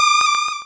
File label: Cry